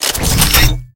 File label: Pickups